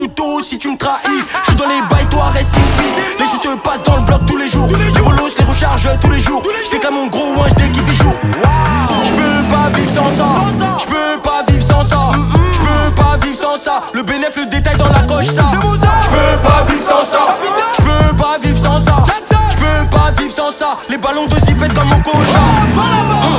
Bx Drill

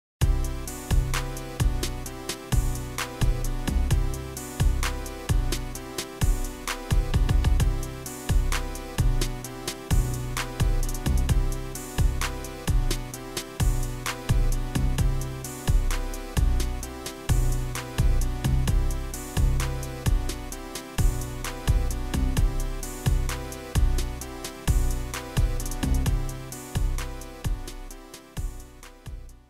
Instrumental

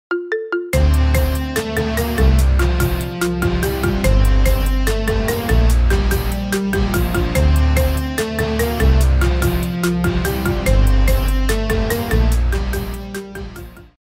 Marimba Cover

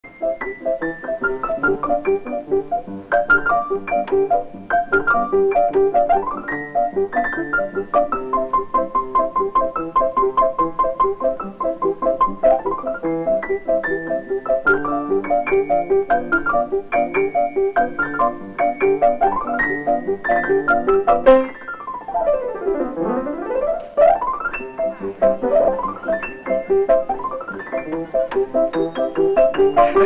Piano; The Big Store

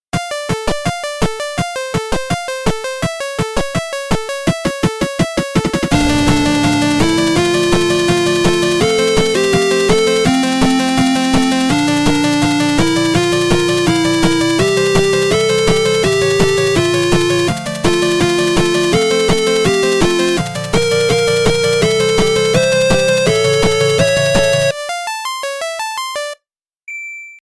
8 Bit Remix; Gravity Falls

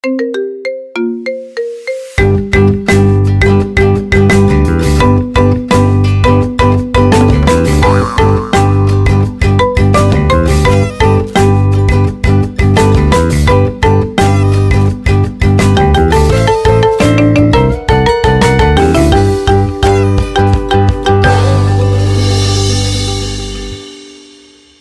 Marimba; Minions

